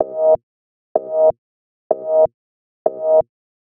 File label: 126 C MUSIC; funky; Loopmasters; Funky Underground Vol 2